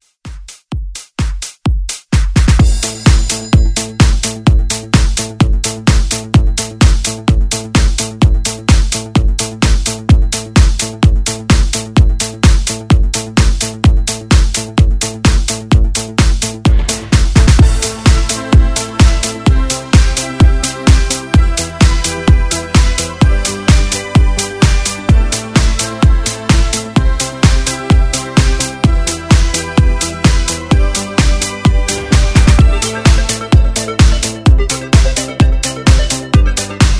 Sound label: 80s Redrum